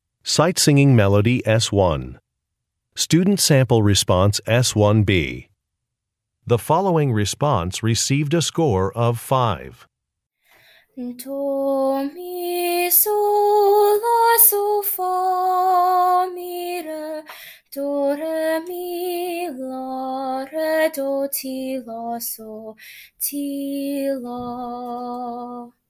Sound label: music samples; Sight-Singing; Sight-Singing Sample 1B